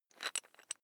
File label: electric drill bit fasten wrench turn very fast